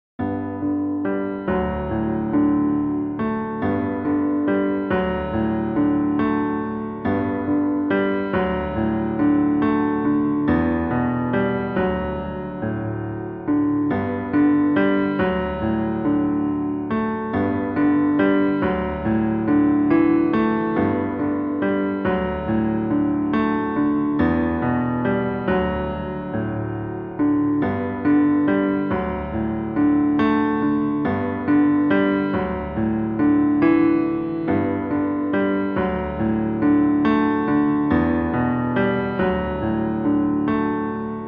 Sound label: Karaoke Acoustic Piano; Instrumental